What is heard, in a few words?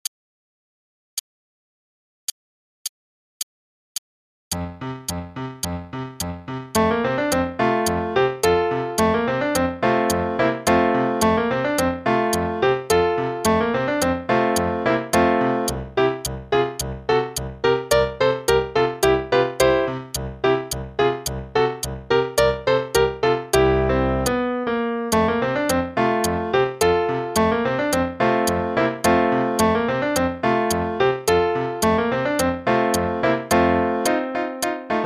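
Play-along, quarter note=108; Ellmenreich - Spinning Song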